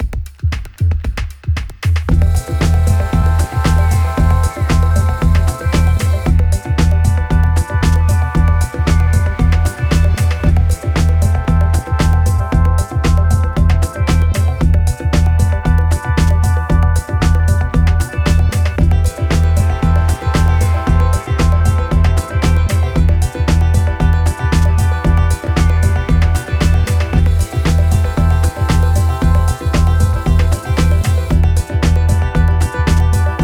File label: BEACH BEAT Short Intro; LANDR